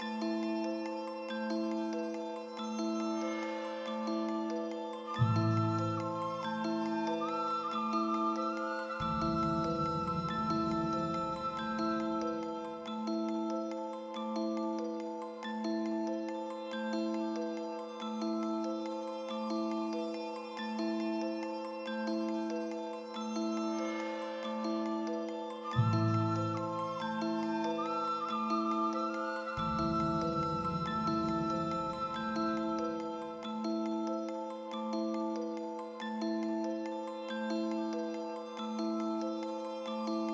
Start Loop; dragonology 2009 nds gamerip

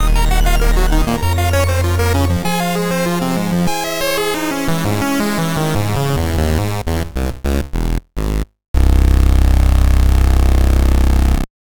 Lose; Card Wars Kingdom; Android iOS mobile 2016

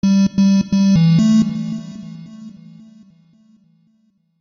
End Fanfare; Outside the Realm; Wii U

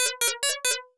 Player Death, nes; The NewZealand Story; Genesis

